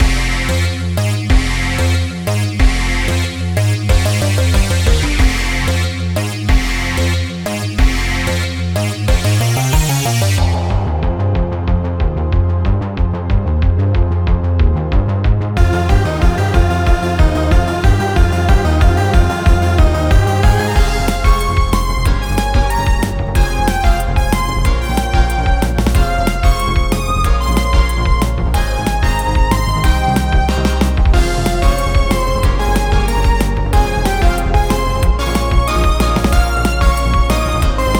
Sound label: fairy boss battles bpm185, Fantasy Boss Battle music. This music is loopable.